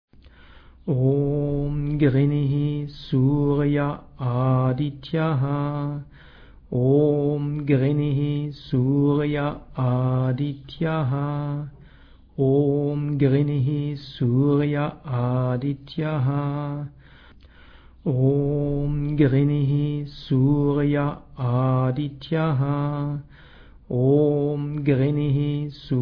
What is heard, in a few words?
Surya Mantra Om Grinihi 9x